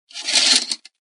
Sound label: pie pass cur open